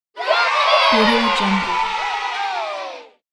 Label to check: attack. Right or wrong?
Wrong